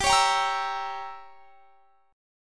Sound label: G Diminished 7th; Gdim7 strummed; x,22,23,24,23,24